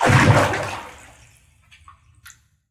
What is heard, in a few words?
indoor water splash reverb; soundscrate